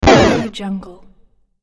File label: laser impact; sonic-boom